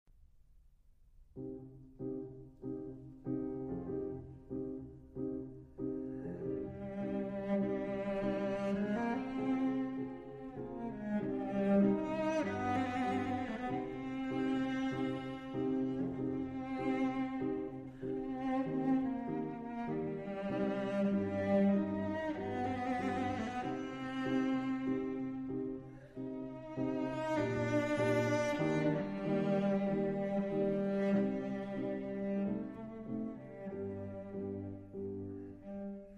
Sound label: Piano Trio E Flat Major Andante Con Moto; Piano Trio No2; D929